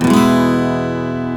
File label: Guitar; ClubFusion; Mexicana Chillitime; Reel5